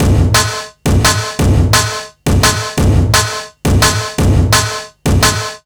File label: 172 break, Jungle; USB Soundscan vol.05; Explosive Jungle; AKAI; 90s sample CD